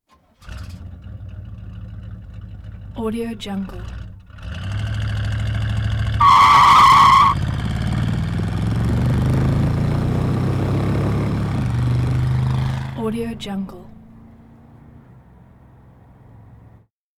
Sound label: 1969 chevrolet chevelle convertible; prosoundfx